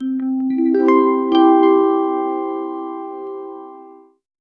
one harp middle c key

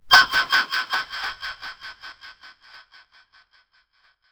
snot sound with echo; everyday life

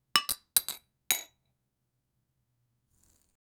cutting a glass ball with, knife

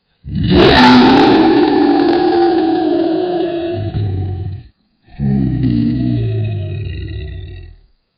roars, kaiju; godzilla

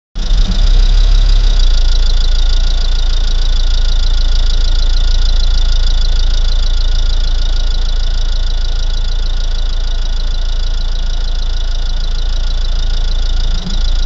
2JZ car engine sounds, accelerate; jdm

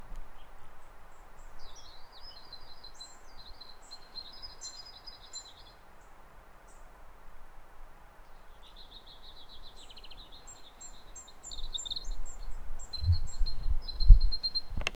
birdsong a bee buzzing; SFX Engine

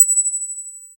small yellow white speck, particles

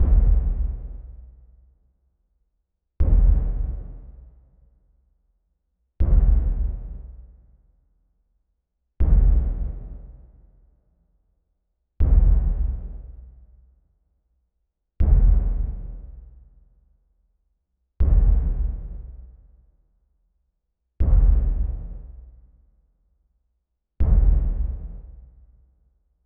rhythmic buildup light, emotional

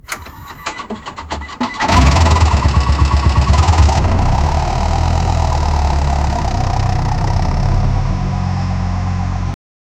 old vintage school, cranking; 1948

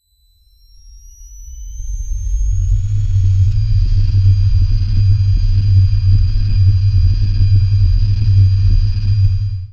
futuristic sound, energy weapon

